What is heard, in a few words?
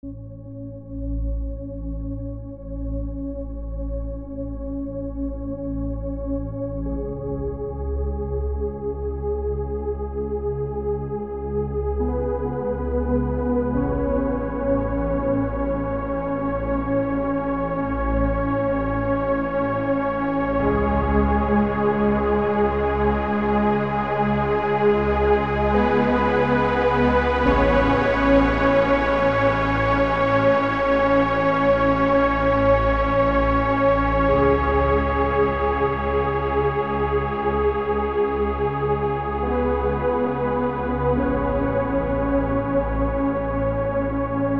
pads